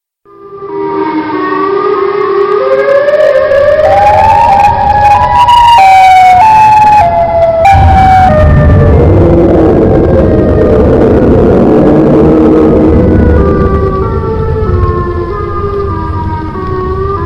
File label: siren head but extremely loud